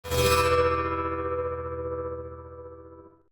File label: stop; stormux